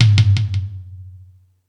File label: Space Drums, E-MU Pro-Cussion; drum machine